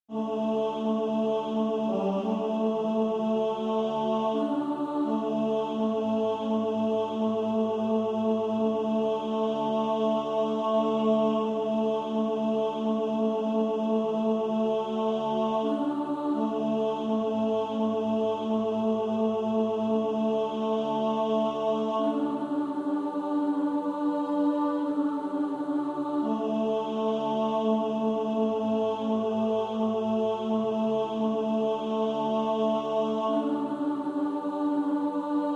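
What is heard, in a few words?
tenor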